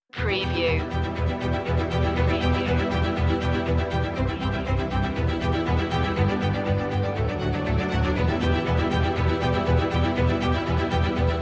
away on the winds no perc

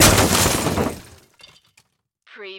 Crash Heavy

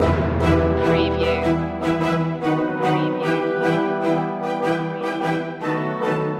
neon nightscape distance loop

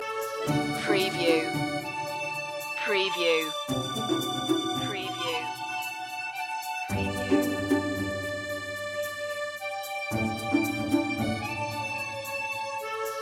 opera loop

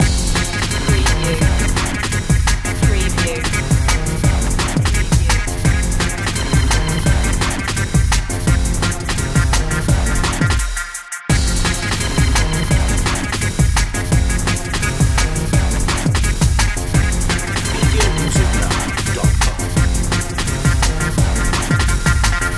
high contrast loop

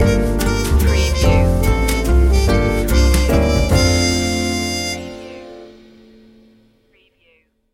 jazz stinger

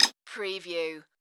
Tui Tweet

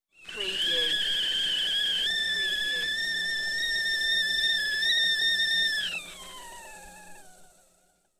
Short kettle whistle; beanstalk audio

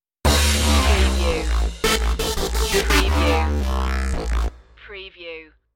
stomp stinger

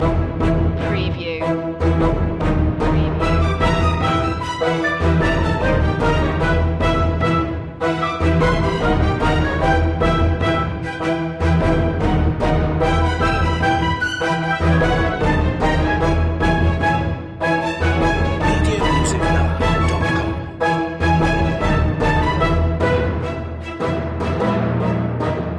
neon nightscape distance loop long

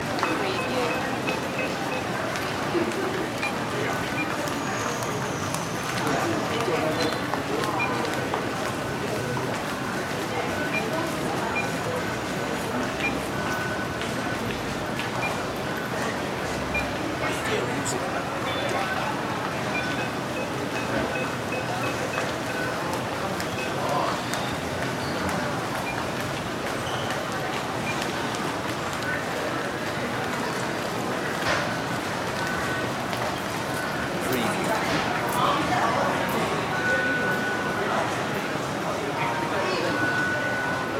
subway exit area ambience loop

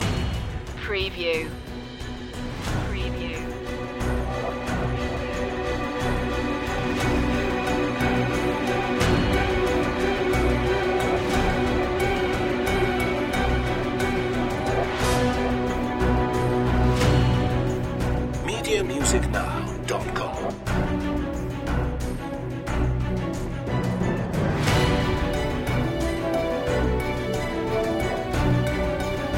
no choir

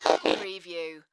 Click Multiple Distortion Whoosh

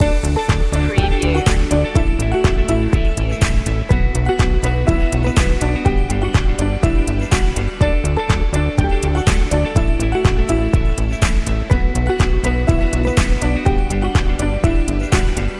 background corp loop

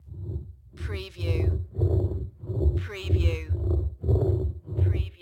CREATURE BREATHING; lynne publishing

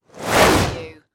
Magic Spell Whoosh

